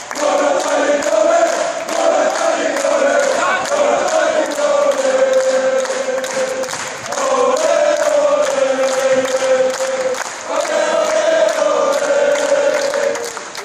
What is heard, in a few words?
ole ole ole, fanchants; flora tallinn